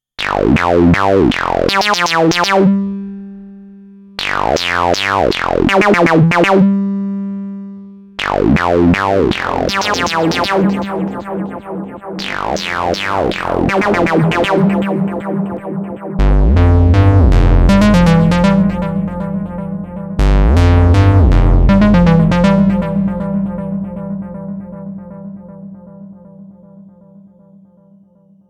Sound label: KORG Analog Bassline; KORG Integer